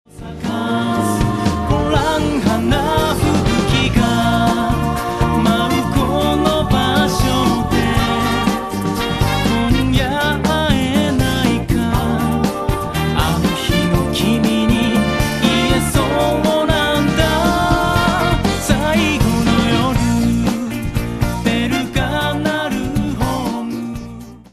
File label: music